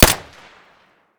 silencer mono, weapons; fort

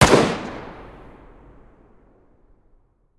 sks muzzle mono distant, weapons

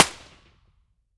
pp1901 silencer mono distant, weapons vityaz

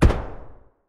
m1911 silencer mono far; colt

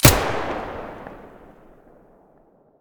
vihr muzzle stereo, weapons

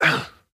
hurt; STALKER; IWP

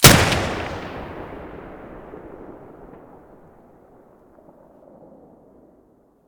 muzzle beneli mono, weapons; m1014

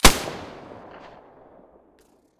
mosin silencer stereo, weapons

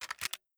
protecta open, weapons

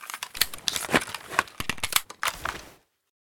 vector reload

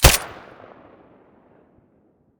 silencer glock17; weapons

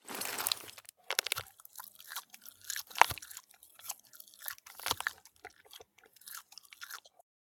kolbasa use; STALKER; IWP; item usage

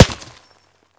dirt, bullet collide; STALKER; IWP

